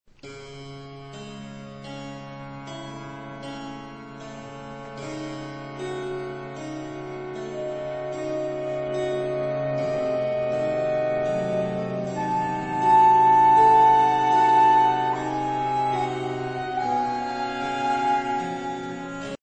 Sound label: american baroque; MagnaLoops